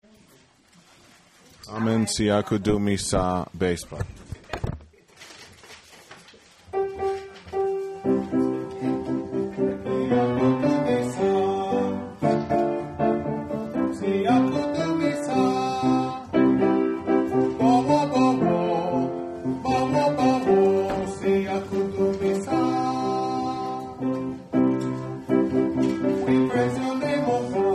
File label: Amen Siakudumisa Bass, choir